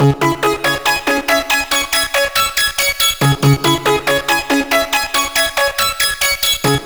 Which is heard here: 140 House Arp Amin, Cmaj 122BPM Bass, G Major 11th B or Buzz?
140 House Arp Amin